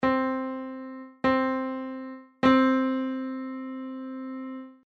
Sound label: unison